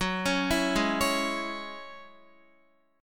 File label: Gb 7th Suspended 2nd Gb down Guitar; Gb7sus2 arpeggiated; 4E,4A,5D,5G,5C,6F